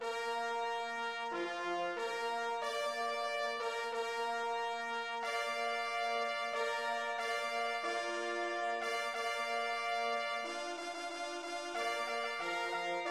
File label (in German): Ehrenfanfare